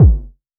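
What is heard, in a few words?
vermona drm1 mk3 bass drum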